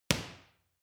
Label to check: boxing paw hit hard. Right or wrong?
Right